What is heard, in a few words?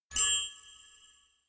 tears glow; anemoi